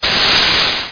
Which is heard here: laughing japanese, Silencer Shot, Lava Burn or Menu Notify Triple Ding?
Lava Burn